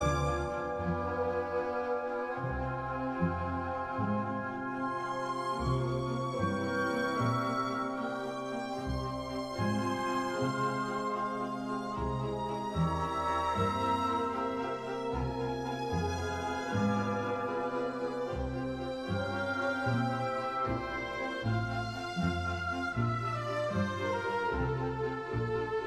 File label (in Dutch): Andante, pianoconcert; Mozart; elvira